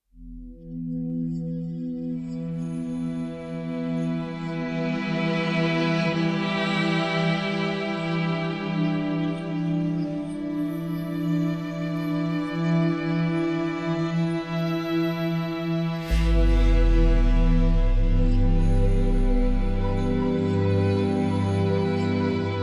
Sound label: distress ambient